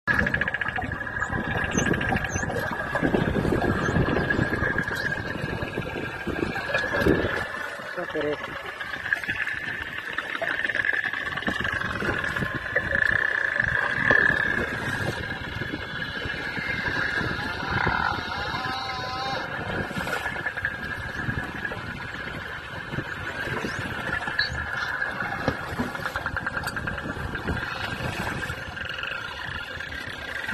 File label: vocalization, whale watch